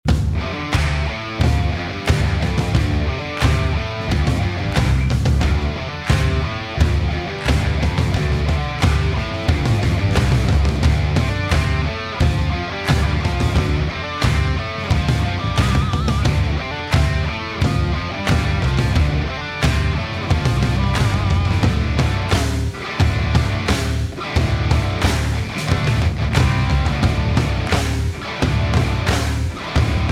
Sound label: Original Stadium Riff Instrumental; Placement Music; PM USA